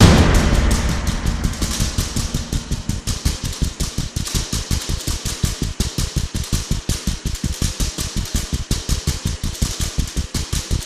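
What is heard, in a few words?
PERC LOOP; THE DECAGON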